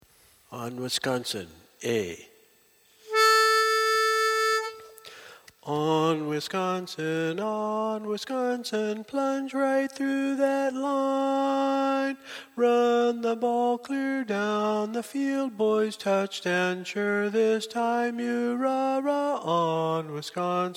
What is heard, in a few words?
On Wisconsin Lead